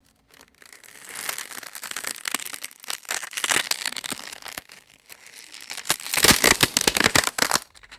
crushing ice in a plastic bag

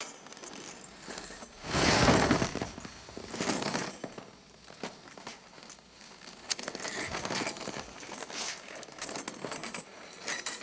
heavy cloth rustling and metal clinking; foley

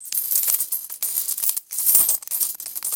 metallic coins jingling in a purse; foley

